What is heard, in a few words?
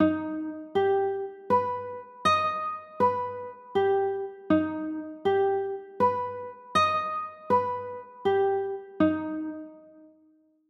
b aug, chords